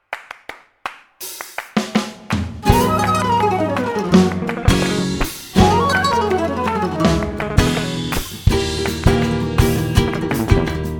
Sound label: Rumba